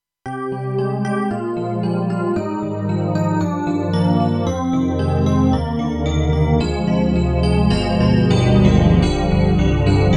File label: MIDI Ensemble; KORG TR-Rack